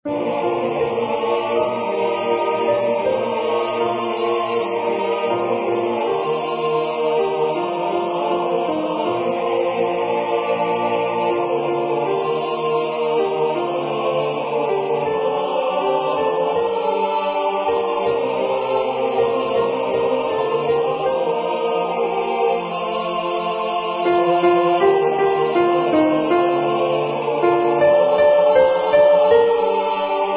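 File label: Soprano; An Irish Blessing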